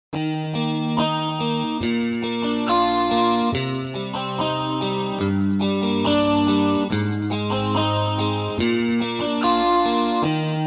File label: guitar chorus